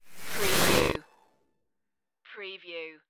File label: tech glitch sparkle